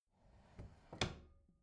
bathroom drawer close soft

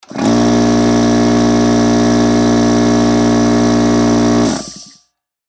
air compressor on and off

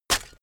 shovel stab

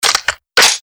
weapon loading

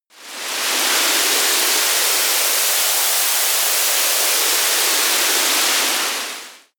water whoosh

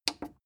plastic button small simple click slow

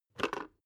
water boiler open top

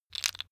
tiles wood

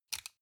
sunglasses plastic grab soft

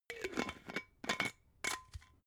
glass bottle massive close slow

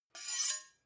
two knives large scrape short

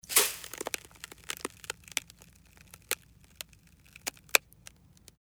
fx crackles

